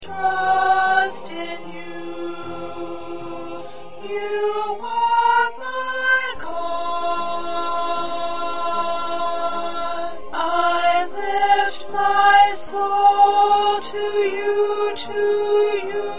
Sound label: 2nd Alto